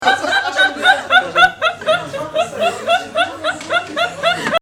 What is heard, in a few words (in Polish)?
smiech